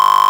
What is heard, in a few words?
dialogue